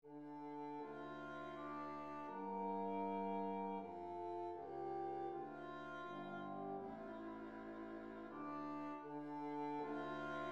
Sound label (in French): sarabande mineur